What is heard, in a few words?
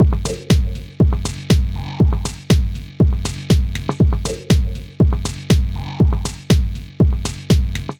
Kit, Drums; One Function; Ableton; Factory Packs; Punch and Tilt